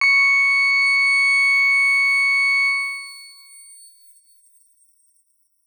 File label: X Grain C#6 mf; healing soundscapes; HSS Ableton Pack1